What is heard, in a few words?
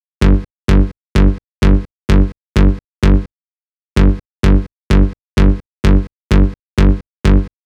Drum Loop 128BPM Bass OFF G; VEC5; Drumloops; Multi Loops